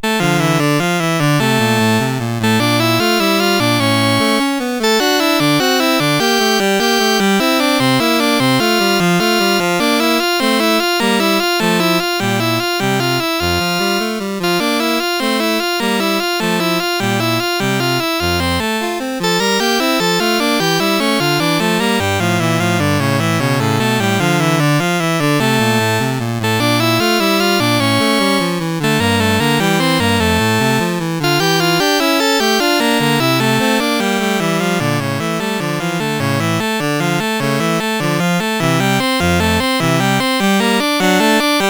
Sonatina in D Minor, CSG8580R5

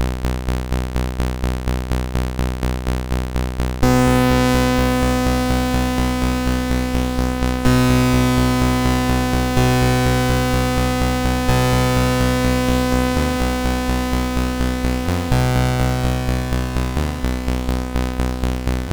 sid MOS6581R3; Outbreak

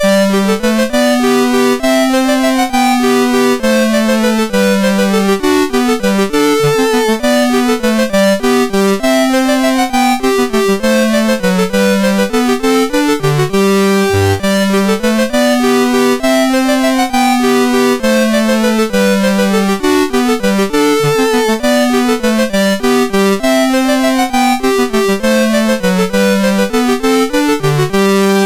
Minuet in G, MOS6581R3

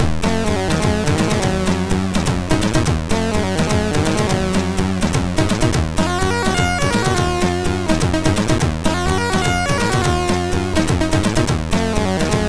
sid MOS6581R3; Scramble Spirits

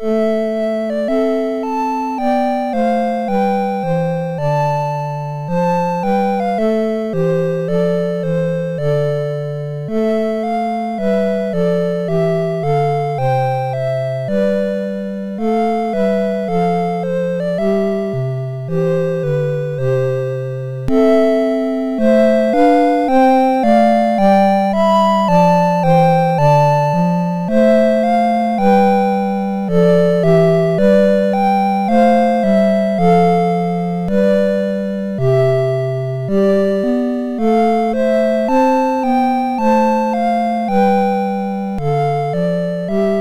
MOS6581; MOS6581R2

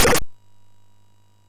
sid MOS6581R4

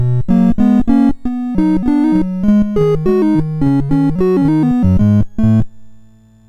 sid, MOS6581R2; Donkey Kong 1983

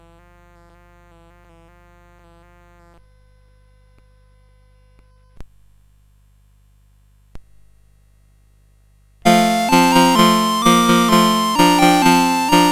CSG8580; CSG8580R5

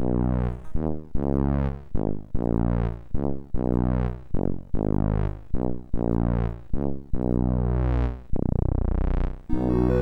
Tuba, MOS6581R2; Bjerregaard Johannes

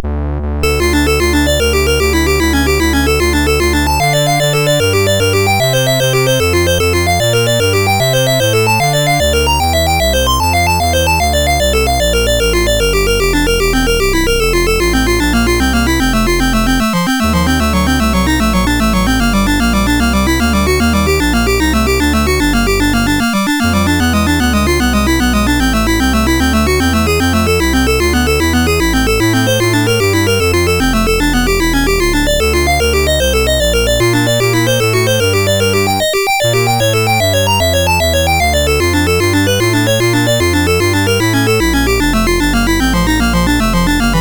Prelude VI in D Major, MOS6581; MOS6581R2

